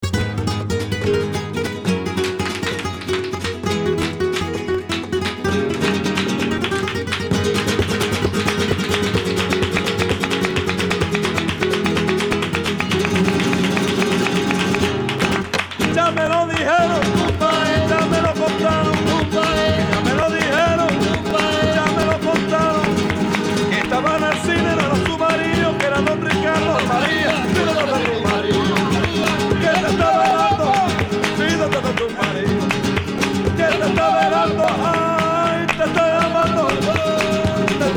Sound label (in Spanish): Kleine Rumba, Guitarras Y Flamenco; WORLD MUSIC; SPANISH